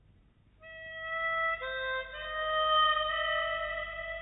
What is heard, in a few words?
harmonica, 44100 Hz; Emission propagation vitesse signal sonore; zoom audacity